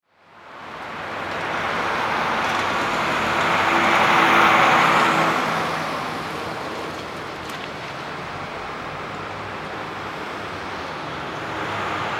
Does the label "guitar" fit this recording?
No